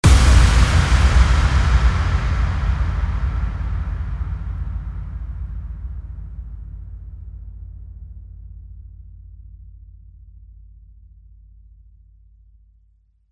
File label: IMPACT